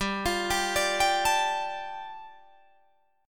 G-7th Suspended 2nd-G-17,20,17,19,20,17 down Guitar Drop D